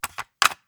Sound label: Cassette Rattle